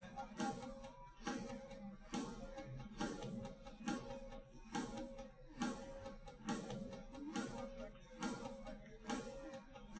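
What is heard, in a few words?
with drums, live rec; SiSEC11; underdetermined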